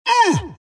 wraith pain; dota; skeleton king

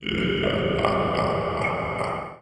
maledict loop; witch doctor

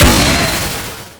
flare fire; weapons; dota; rattletrap

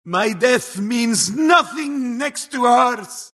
death; skywrath mage

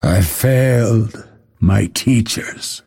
death; dota; phantom assassin

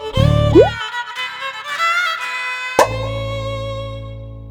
taunt; dota; snapfire

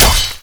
attack; dota; bloodseeker

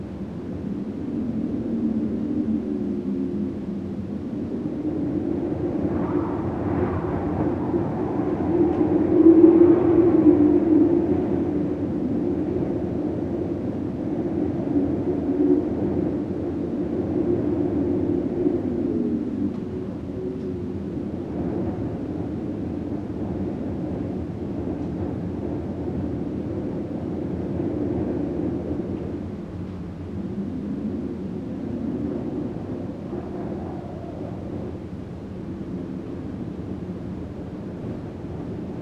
icy wind; ambient; dota

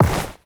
robes drop; ui; inventory

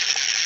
fish reel; dota; crownfall

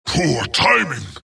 death long; dota; skeleton king

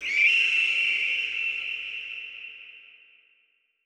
echo strike silence; weapons; dota; kez

